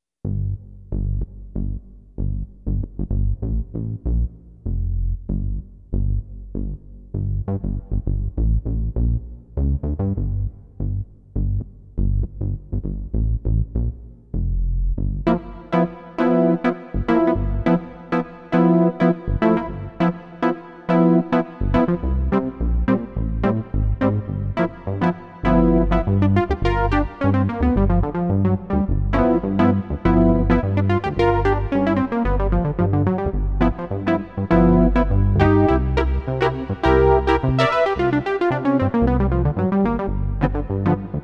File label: Funky Analog Polysynth, Oberheim OB-8